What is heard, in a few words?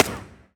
shot revolver; Furcherro